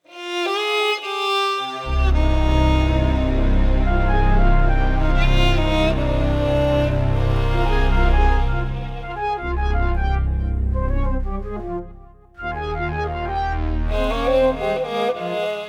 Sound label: FM Waves